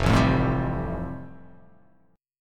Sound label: F Major Add 9th; Fadd9 strummed; JGuitar